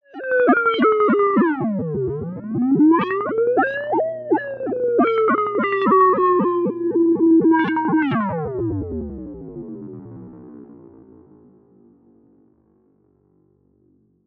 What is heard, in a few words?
droid fishtailing dry